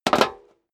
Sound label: step high heels metal dry jump; schritte; Footsteps; menschen